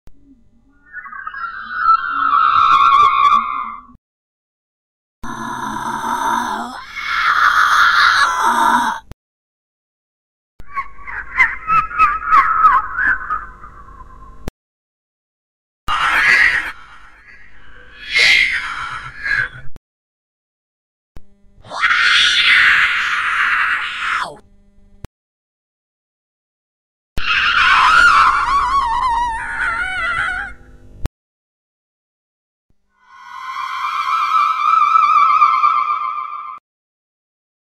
horror sound scary sounds creepy sounds sounds scary